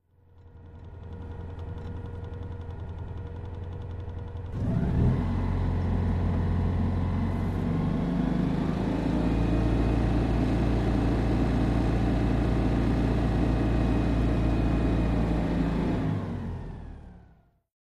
Citroen Deux Chevaux, Interior Pov, Idle with Revs